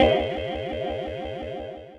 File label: LFO, Synth Keys; Sonix LFO Chabela; Ableton; Glitch and Wash